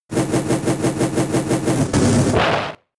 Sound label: Fire Blast; attack moves; Pokemon; Gen 4; DPPL HG SS